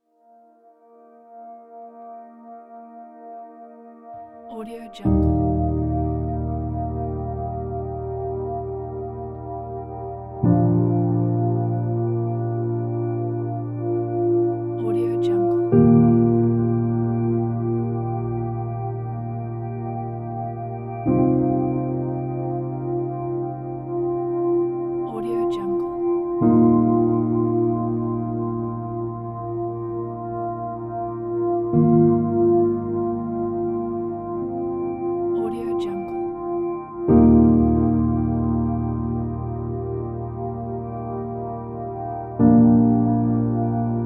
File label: emotional ambient